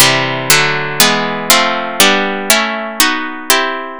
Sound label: str synth test